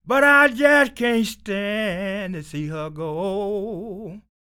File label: SING, Blues Soul; Spectrasonics Vocal Planet